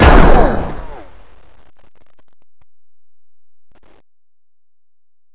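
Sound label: m4 fire silenced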